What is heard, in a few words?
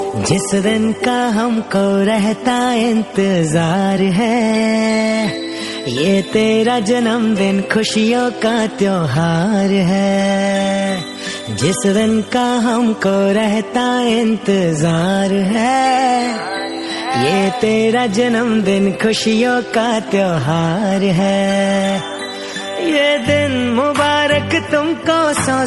Sister Birthday Song